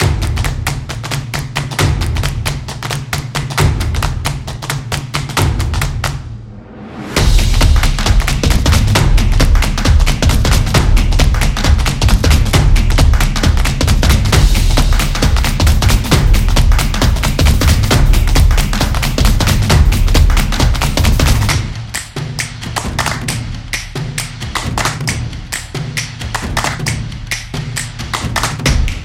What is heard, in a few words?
Dynamic Slideshow